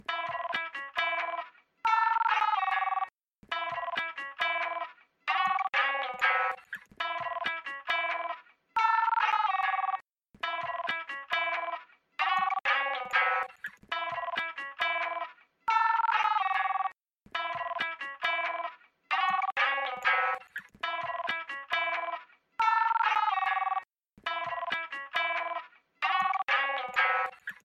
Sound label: Notebook Phone Ring